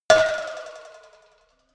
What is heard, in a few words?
cowbell move hard